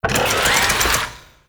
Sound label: Melee Slam Charge; Warframe; Necramech